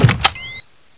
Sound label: BOMB PLANT; Bombahman